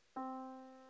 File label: Yamaha Disk, piano sound